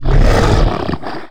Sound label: sight, strogg; Quake 4 Alpha; strogg tik